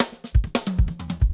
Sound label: Triplet Fill 90 bpm, drums; BASEMENT DRUMS VOL.1